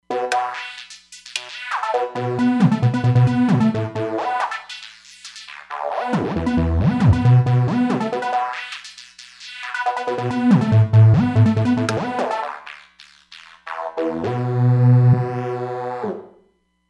Waving TB303, Roland Fantom XR

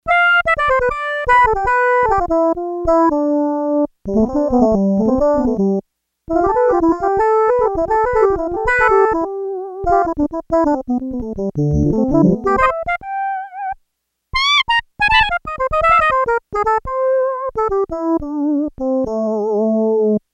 Harmo Synth, Yamaha TX802; Factory Patches; Voices

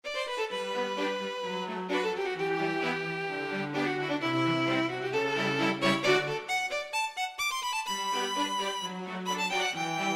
ClassicalTune; Kurzweil K2000 Orchestral ROM; Orchestral ROM Supplemental Disk

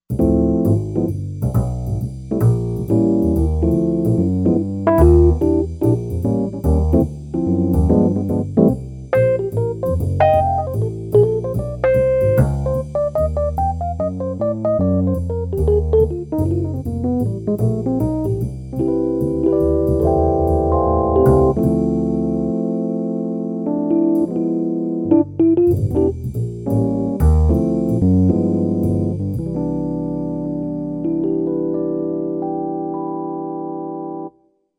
Lounge Kit, Roland Fantom XR

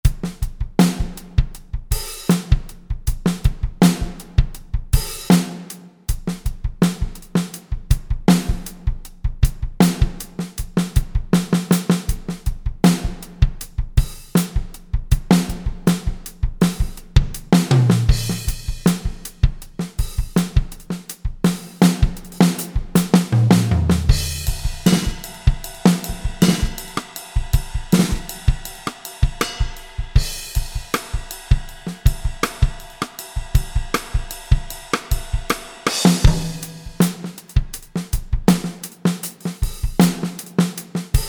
Studio Fat KIT, Roland SRX-01 Dynamic Drum Kits

